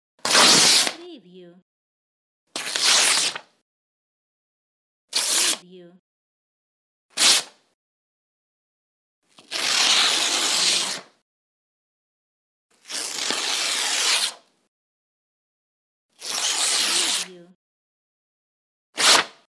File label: Tearing Paper